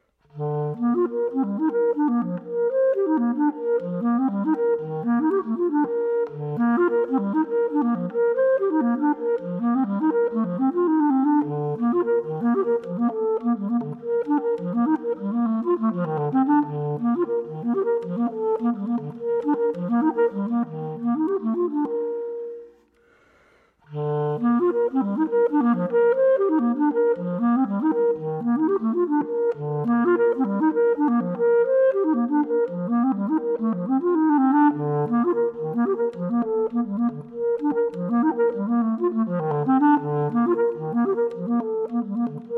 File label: heights clarinet version